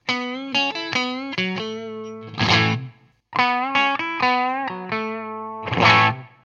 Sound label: bypass neck & bridge; Rambler 25W